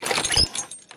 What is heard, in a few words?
metal Crate Open